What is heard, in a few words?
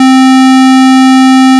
square, SQ80